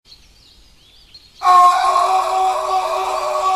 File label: screaming